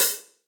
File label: Hihat Closed Deep Snare Dynamics; Drum Hits; Standard Hits; Ableton; Factory Packs; Session Drums Club